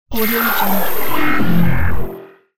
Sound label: game movement